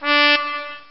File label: trumpet